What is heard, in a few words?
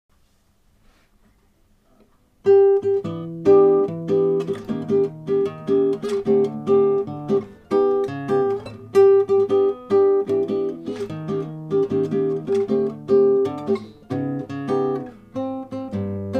guitar solo